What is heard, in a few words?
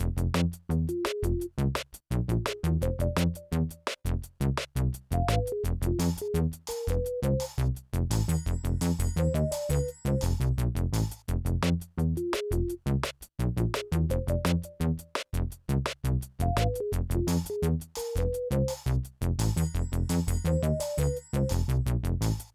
Low; DreadWoodQuestOneMain D